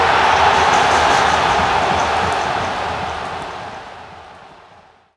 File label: crowd gol; running back